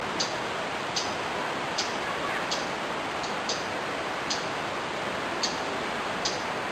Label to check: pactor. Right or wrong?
Wrong